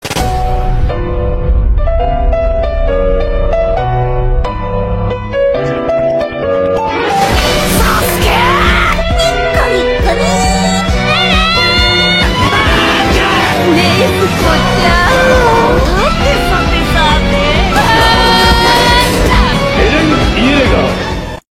réveil animé

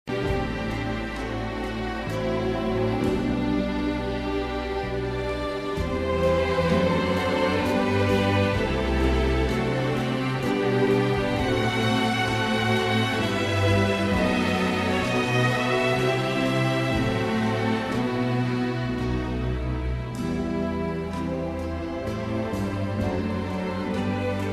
Handel Classique

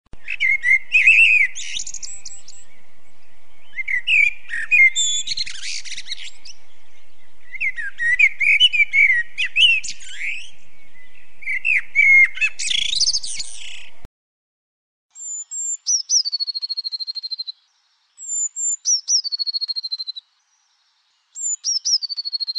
Les Chants D'oiseaux, sonnerie

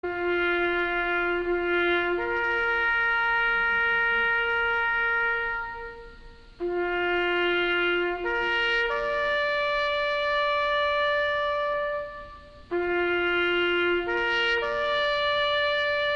cornemeuse, sonnerie

